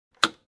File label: Aircraft Seat Adjustment Handle click